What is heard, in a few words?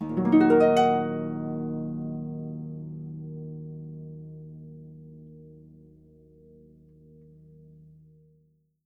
D#, Percussion & Harp; Miroslav Vitous